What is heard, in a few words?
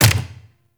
Turret Dry Fire; Lasrian APC